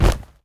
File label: Package Delivery Impact